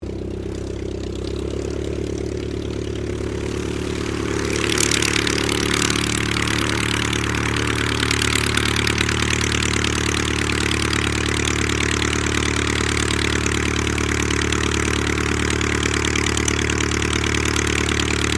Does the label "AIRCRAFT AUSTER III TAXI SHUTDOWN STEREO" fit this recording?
Yes